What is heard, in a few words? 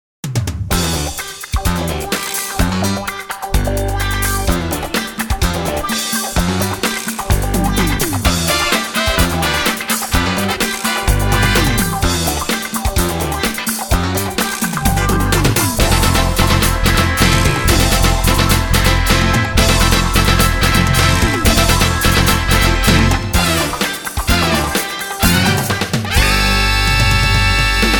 Disco; Digital Juice